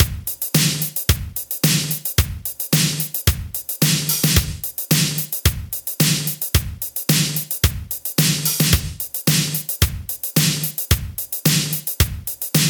110 bpm drum loop sample d sharp key, kick tuned to the D# note